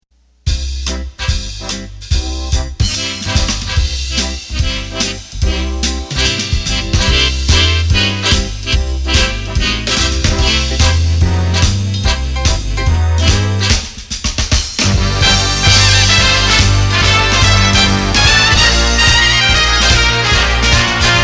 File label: Swing, accordion